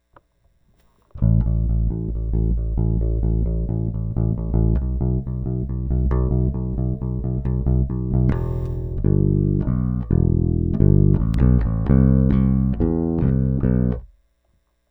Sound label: bass